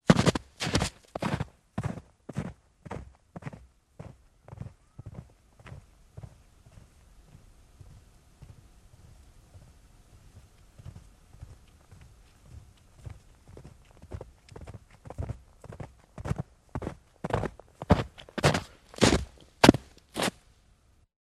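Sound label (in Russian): человек удаляется и приближается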